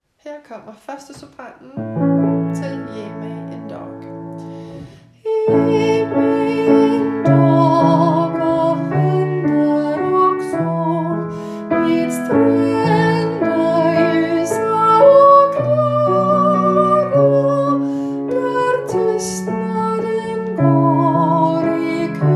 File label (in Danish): 1. sopran